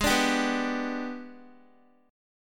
Ab Minor Major 7th Flat 5th, Guitar; strummed; 4F,4A,5C,5G,5C,6E; x,11,14,12,11,x; JGuitar

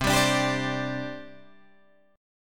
C Suspended 2nd C Guitar Open G; down; chord shape

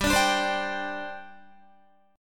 G Suspended 4th, Guitar; Gsus4 strummed

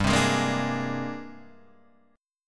Ab Major Sharp 11th G

